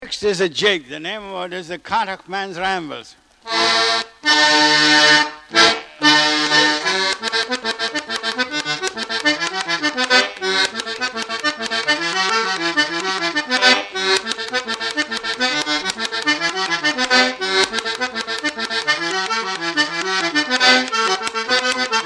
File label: Jig; The Cannaught Mans Rambles